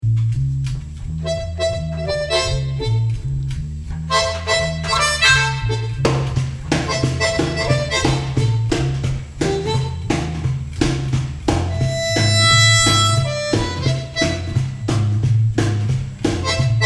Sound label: Bass Harp A Third Pos